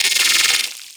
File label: Crp Arachnoid Micro Vocal Attack; Warframe